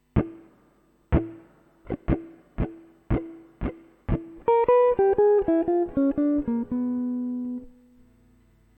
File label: diminished lick